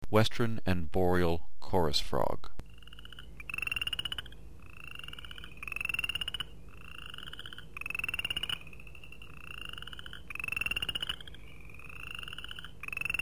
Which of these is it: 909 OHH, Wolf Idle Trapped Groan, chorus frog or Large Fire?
chorus frog